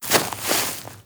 Grass Turn

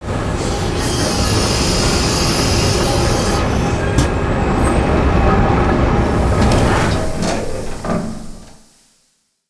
Chair Descent

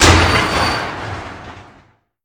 Timer Reverse

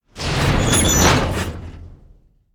Safety Gate open

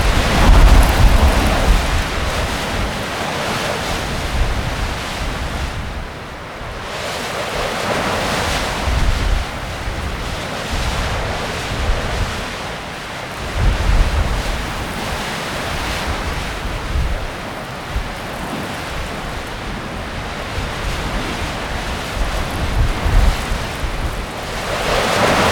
Wind Day Loop

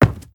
Soft Wood Run; moul

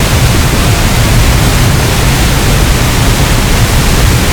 Outside Hut Water Loop; moul

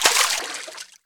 Water Run

